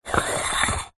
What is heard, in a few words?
household ceramic bowl square twist rotate stone surface